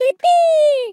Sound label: Yippee; Neru; SM64 CO-OP; Triple Baka Pack